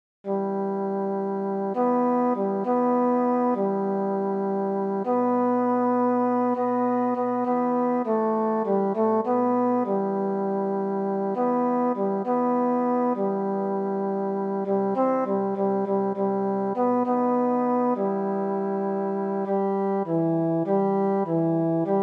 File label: tenor